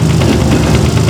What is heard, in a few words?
giant soldier loop; video games; source engine; tf; mvm